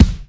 airy kickdrum d sharp key

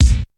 big kick single hit c key

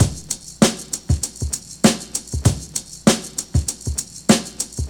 98 bpm 00s drum groove A key; drum loop

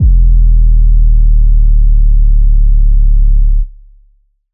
phonk kicks oneshot 808 e; kick drum

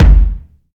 subtle reverb kick drum sound F sharp key

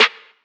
rich top end steel snare drum f key; snare drum samples

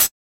treble heavy pedal hi hat sound g sharp; g sharp key

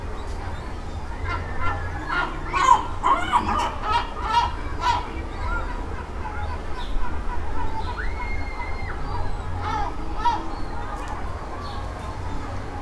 seagull sound; animals

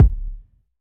rich lows kick drum sound c sharp key

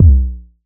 rich low end kickdrum c key

